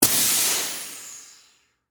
Air Release Valve, Industry, Air, Release, Air, Compressor, Valve; SoundEffects-BlastWave